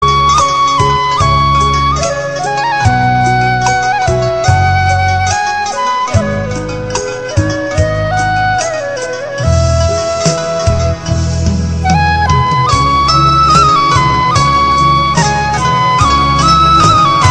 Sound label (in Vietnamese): Hòa Tấu, Sáo Trúc